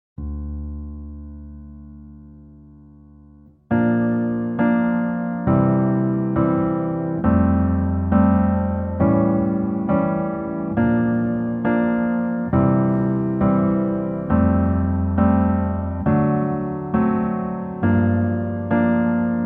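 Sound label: Piano version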